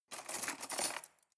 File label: cutlery cake forks drawer grab